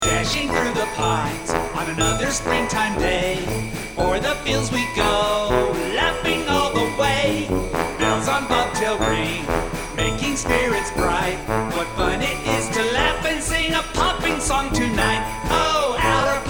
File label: Jingle; AllerPops